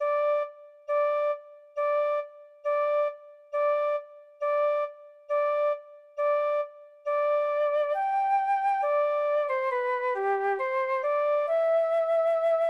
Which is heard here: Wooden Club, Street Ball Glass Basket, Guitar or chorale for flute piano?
chorale for flute piano